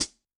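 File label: Rock Pedal, HiHat; Yamaha DTXpress-II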